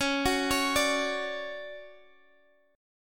D-Major 7th Db

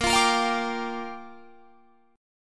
A sharp 6th, Guitar Drop D

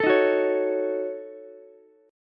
Eb Minor 7th Flat 5th, down, Ukulele; Ebm7b5; strummed; JGuitar

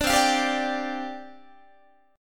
C Suspended 2nd Suspended 4th, down, Guitar

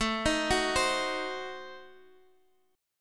A Diminished 7th A down

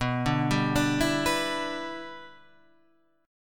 E Minor 7th B

